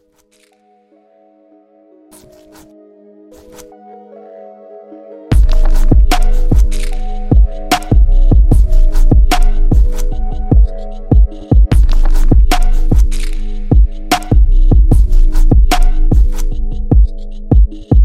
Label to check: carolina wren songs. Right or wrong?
Wrong